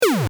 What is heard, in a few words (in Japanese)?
pyuun